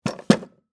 Telephone Set Down, Technology, Telephone, Set, Down, Western, Electric, Bell, System, Phone, Antique; BlastWave